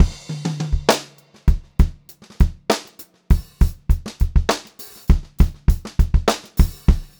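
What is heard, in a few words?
variation, pop-rock drumloops; USB Soundscan vol.37; AKAI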